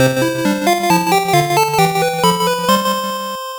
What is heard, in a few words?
Unused Victory Jingle; Hataraku Kuruma Diapet Daishuugou; Pico